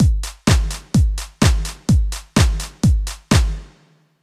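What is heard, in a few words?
Casio XW-G1 drum sequencer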